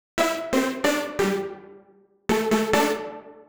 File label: 136bpm Lines n Loops Synths, uk garage samples